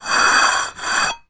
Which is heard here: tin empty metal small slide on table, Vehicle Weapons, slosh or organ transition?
tin empty metal small slide on table